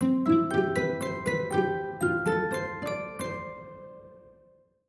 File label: boot sound; Tecno Pop 5